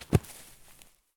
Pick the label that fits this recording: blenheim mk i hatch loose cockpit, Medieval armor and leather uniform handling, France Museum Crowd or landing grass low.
landing grass low